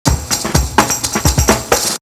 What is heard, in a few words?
Beat; AMG